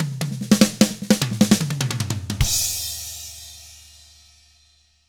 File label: SHUFFLE, Drums; ILIO; Double Platinum Drums 1